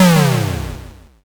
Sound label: explosion m